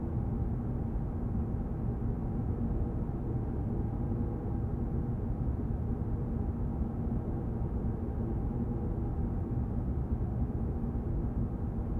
Atmosphere Scifi Bunker Loop Stereo; Essentials Series NOX SOUND; Sample A Sound Effect

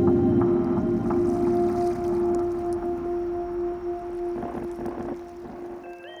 Creaky, Pads

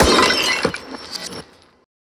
Glass Break; FREE SFX PACK VOL 1

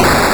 explosion, Retro Sounds 32-Bit

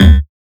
Buzz Basement; FREE SFX PACK VOL 2

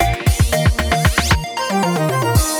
Pads and Plucks; Solos

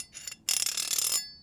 Serrated Knife - Scrape, kitchen knives; Micro Pack

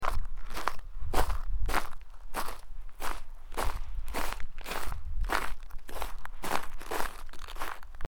Gravel Footsteps; horror; horror sfx vol 2

